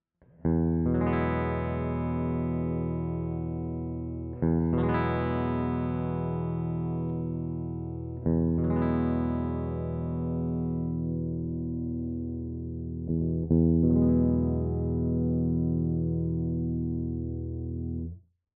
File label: Cort MBM 2H clean Tone Poti